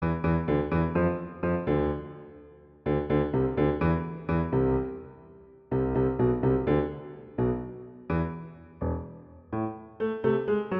Marche Funebre; Gounod; Funeral March; Marche Funebre d'une Marionnette

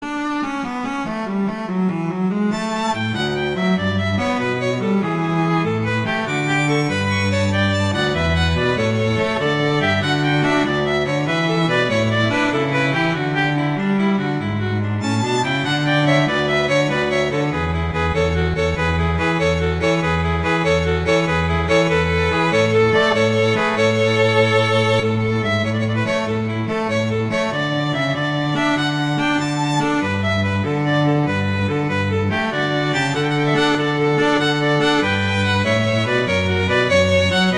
Gigue; Pachelbel; Canon

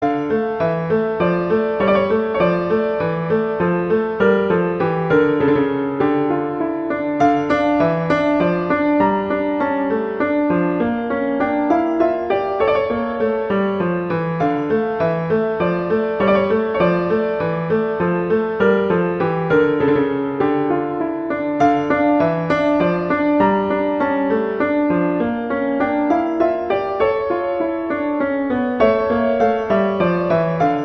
Minuet; Bach; Eng Suites; Suite IV

